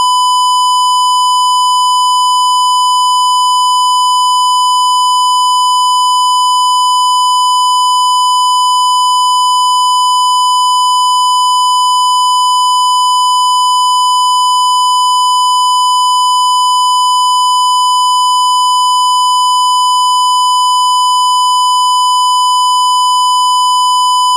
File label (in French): carré